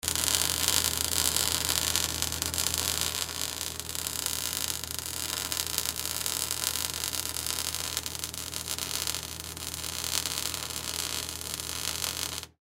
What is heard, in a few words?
Welding Gun Spark; Welders; Machines; INDUSTRY